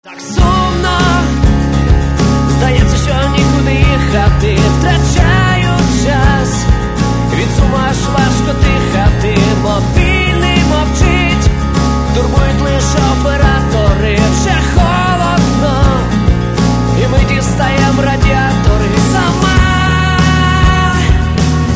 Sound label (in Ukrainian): akustychny